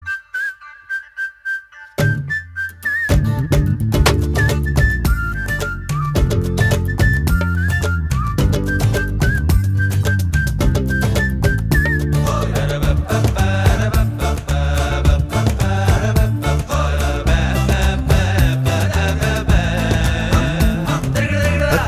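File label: Promo Song background music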